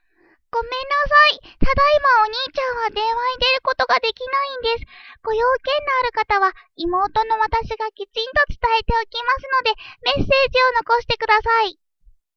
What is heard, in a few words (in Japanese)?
denwa